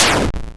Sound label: explode, 8-Bit Sounds